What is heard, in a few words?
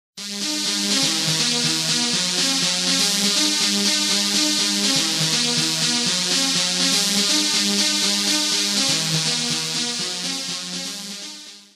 fpga synth sawtooth lead